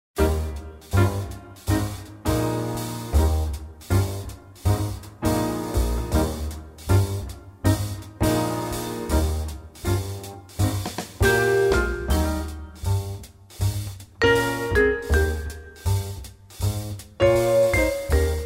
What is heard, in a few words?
Quartet, Groove